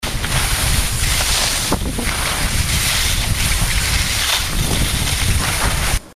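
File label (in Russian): звук скольжения с горы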